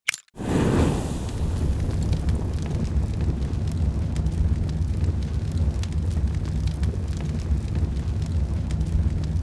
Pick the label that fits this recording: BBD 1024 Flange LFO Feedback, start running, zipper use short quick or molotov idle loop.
molotov idle loop